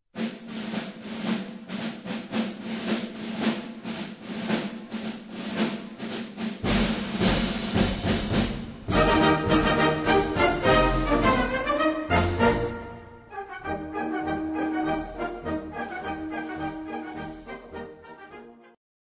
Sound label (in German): Oesterreichische Marschlocke